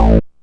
Synth Hit A

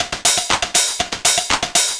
Combo Hat; Sonic Foundry (Sony Creative Software); Trance NRG VOL-2; Drums; Combo Hats

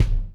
kick, Millenium MPS-400

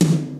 POWER TOM HI, MPC-60 DRUMS; Greytsounds VOL-1

